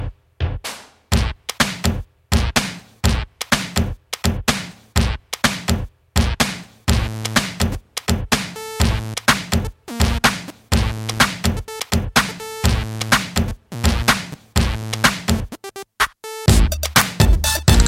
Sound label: Rock